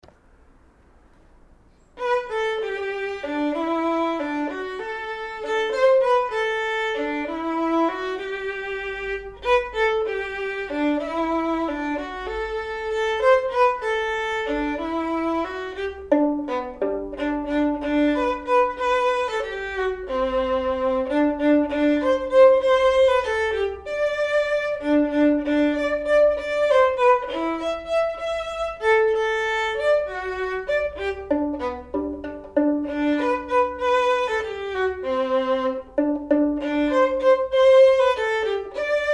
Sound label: perf vln; Building Technique with Beautiful Music, Book 1 Violin